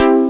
another chord maj; The Epic Collection 3; Epic Marketing; muzak

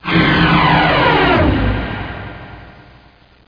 Flame

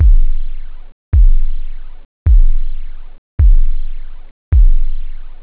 short, drums techno; OctaMED SoundStudio V1

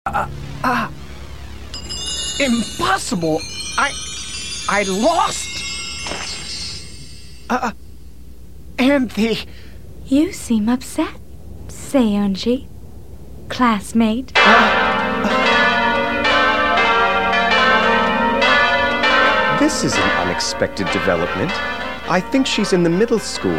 victory; utena

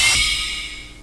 fireplace puzzle in; Inside Mac Games; Myst Demo; Myst Preview

